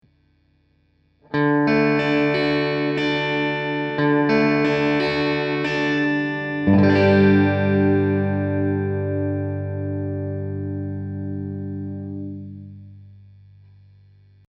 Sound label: TC1210 Pure